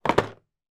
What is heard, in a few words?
toy puzzle box down on surface gently; zapsplat